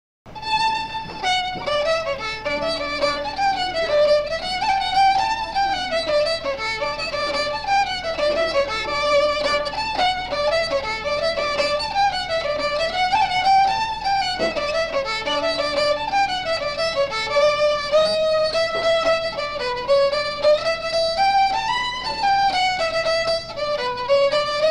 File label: fiddle; sweets of may